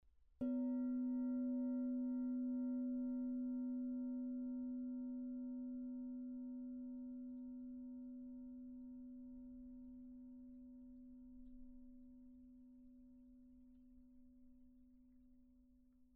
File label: low soft, aluphone